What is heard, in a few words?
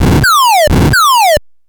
Gun; Graz